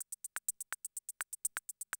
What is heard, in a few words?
CR78 125, drum loops